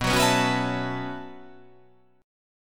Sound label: B 9th Suspended 4th B down